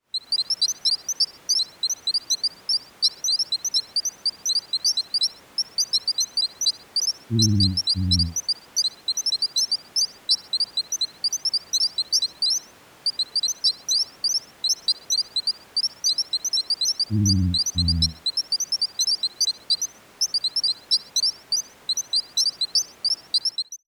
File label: night crickets soft